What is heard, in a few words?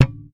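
thumb, bass; Xsample VOL-1; SLAP+THUMB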